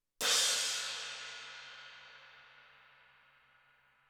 18 THUNDER CRASH, cymbals

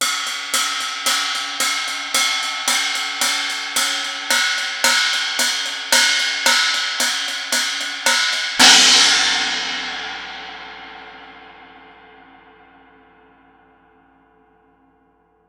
SPECIAL EDITION China 18

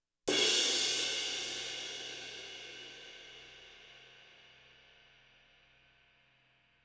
20 CRASH CRASH, cymbals

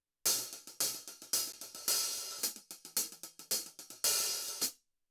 14 HH CLOSE OPEN, cymbals

